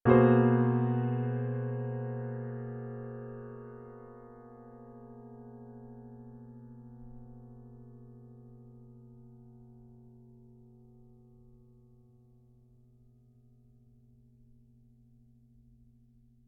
piano dissonance; ピアノ

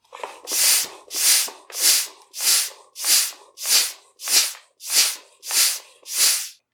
bike air

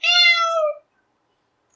cat

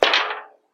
iron

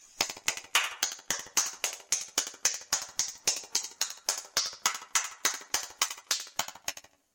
clap

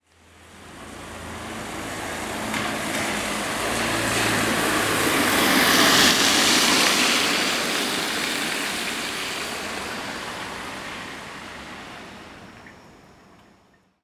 rain car across

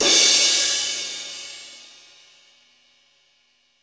symbal

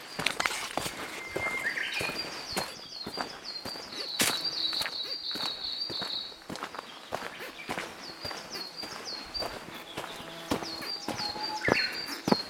trekking footstep

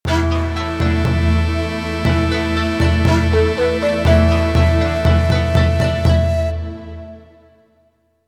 sengoku jingle

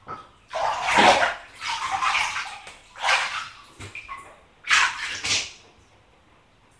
bath out

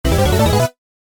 powerup; 【効果音】パワーアップアイテム; パワーアップアイテムをゲットして成長するイメージのコミカルな効果音素材です。

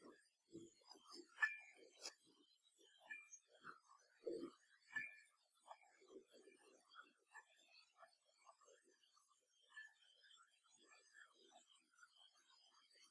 die light

